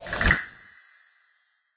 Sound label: Pinata clicker game reset